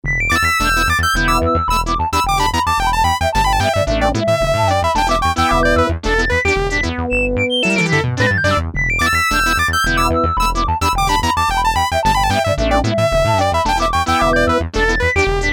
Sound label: Sequence, Six-Trak; Sequential Circuits; Factory Sequence